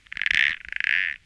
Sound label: small frog; ambient, random animals, frog groups; Left 4 Dead 2